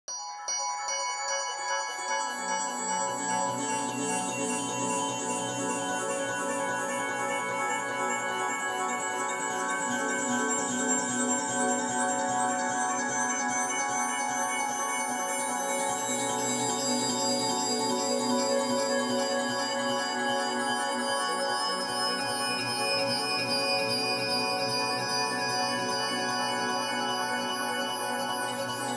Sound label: ambience; welcome world; ambient or nature